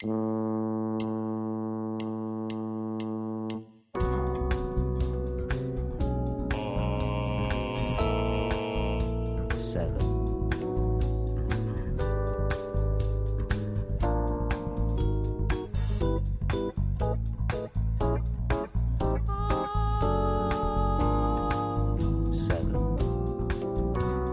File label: A Blues Slash Chords Funk; slash chord ear training; Practice Perfect